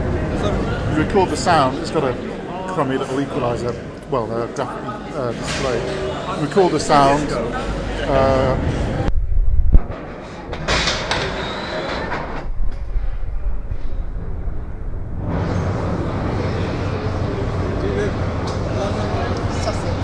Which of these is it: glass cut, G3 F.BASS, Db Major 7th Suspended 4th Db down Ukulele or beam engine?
beam engine